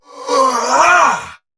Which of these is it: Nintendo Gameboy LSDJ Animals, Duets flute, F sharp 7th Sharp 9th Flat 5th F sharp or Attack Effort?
Attack Effort